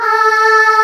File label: Choir; AKAI S-Series CD-ROM Sound Library VOL-2; CHO HARP